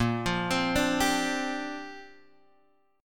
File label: A sharp 6th Add 9th, Guitar, Open G